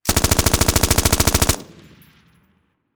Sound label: gun machine gun automatic 556 caliber m249 long burst close perspective